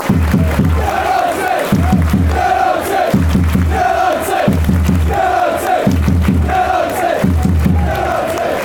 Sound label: drums, fanchants; united